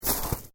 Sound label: Footsteps Boots Snow Shallow Step; Footstep Foley; Footsteps (Sound Effects:Human)